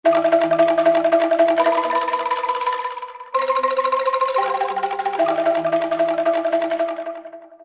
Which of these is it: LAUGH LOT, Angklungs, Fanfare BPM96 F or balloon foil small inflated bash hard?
Angklungs